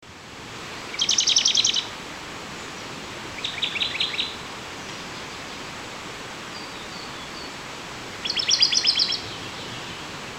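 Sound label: Phylloscopus schwarzi song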